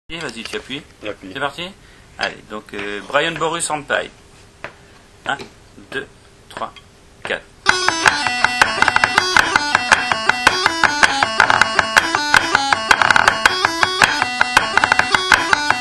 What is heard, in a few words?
Hornpipe; Brian Boru-s Hornpipe; TEAM BATTEURS CONCOURS